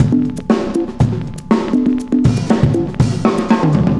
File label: cooling 120, loops, 120bpm